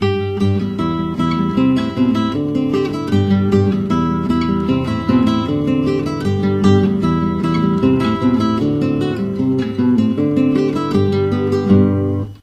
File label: guitar